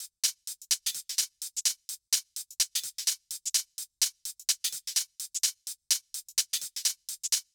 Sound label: fill